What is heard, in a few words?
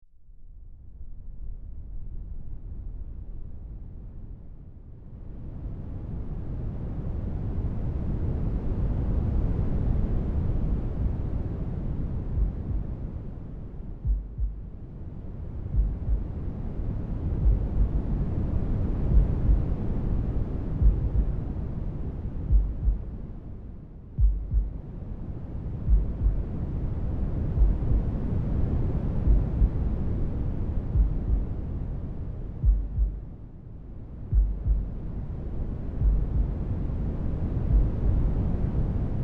noise and heartbeat